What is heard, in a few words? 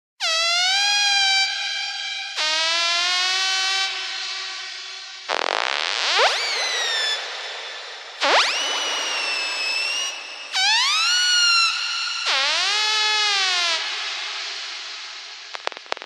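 blue whale; zvuki kitov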